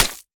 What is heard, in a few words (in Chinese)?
泥巴破坏声